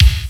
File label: TYNY KICK; Crypton - DrumBase5000